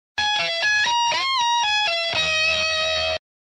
riff bas boosted

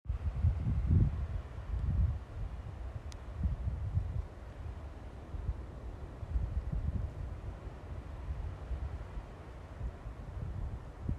wind in trees

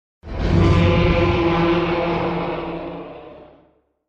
male muto roar

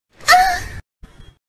uhhhhhhhh

